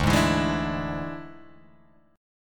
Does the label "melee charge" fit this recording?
No